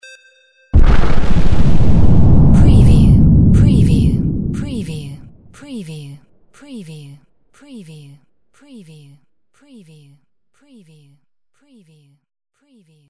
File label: EXP SHORT NO DEBRIS; windy beach audio